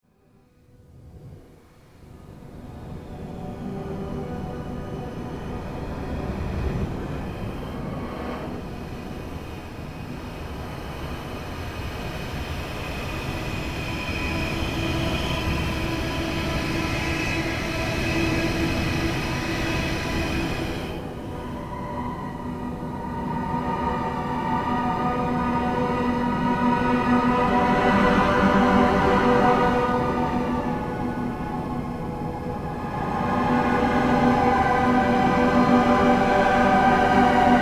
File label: Trash city soundscape